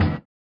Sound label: SWUSH; Spectrasonics; Metamorphosis